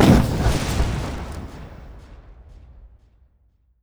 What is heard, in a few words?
npc atronach flame conjure